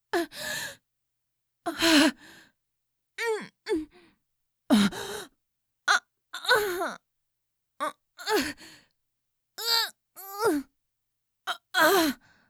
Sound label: Gasps and Grunts